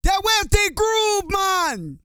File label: REGGAE, Vocal Planet, World Voices